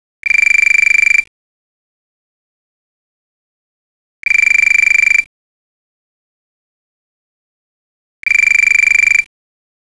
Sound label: trimphone; ring sample